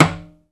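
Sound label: closed rim, Timbale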